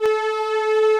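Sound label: strings, Roland D-70; Drums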